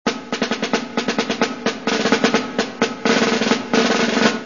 caixa met

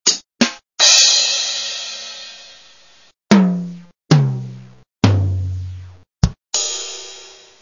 bateria

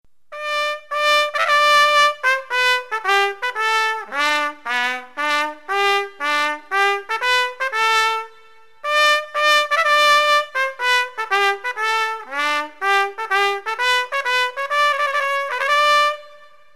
trompeta

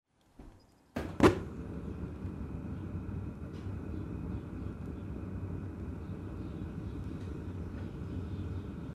foc de gas

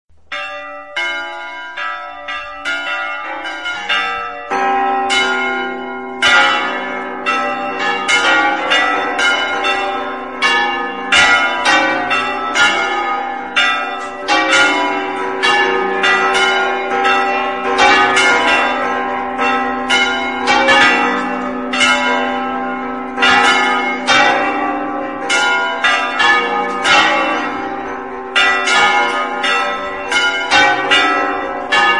repic de campanes; Tocs de Festa

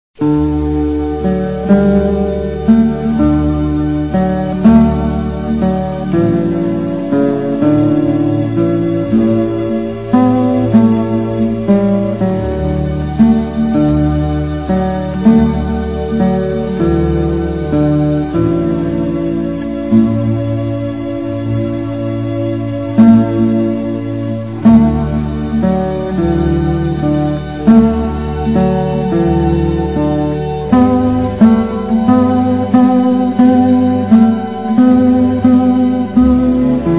tranquil

